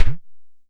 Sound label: Perc Bend Up, Tama TS-206